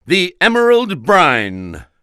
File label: Menu